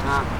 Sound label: Goose